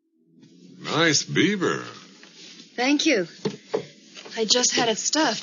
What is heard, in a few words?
Beaver airplane